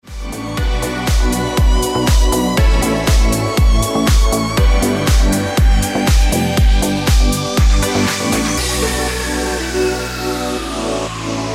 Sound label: Ringtone